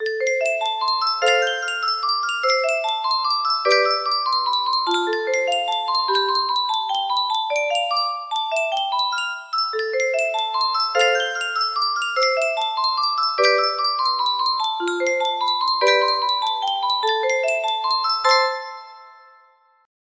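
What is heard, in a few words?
music box, music box melody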